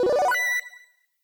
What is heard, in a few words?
UI Scene Feedback Very Good; They'll Come from the Moon